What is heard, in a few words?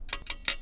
detach Wrench; Kerbal Space Program; KIS